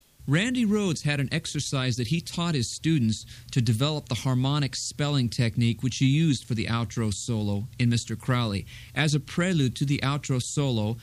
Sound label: Exercises arpeggios